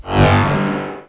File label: level start